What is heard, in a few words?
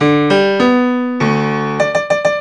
rythm